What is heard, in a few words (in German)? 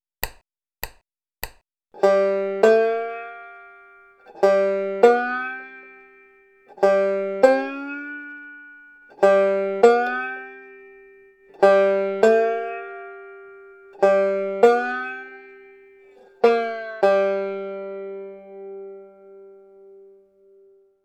erste bottleneck slides